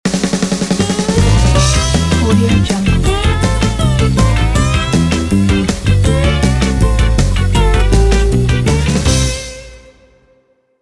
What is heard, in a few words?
happy ska logo